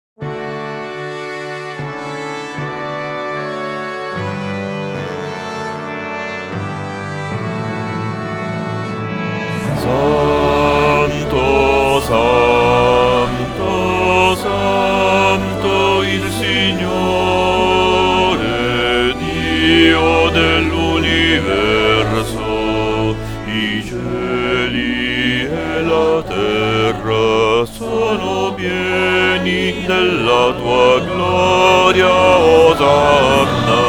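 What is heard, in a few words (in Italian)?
Santo bass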